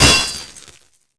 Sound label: sentry bombball hit world